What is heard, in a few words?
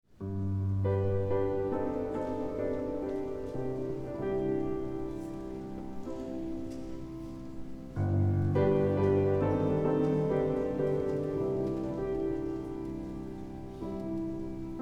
Lullabye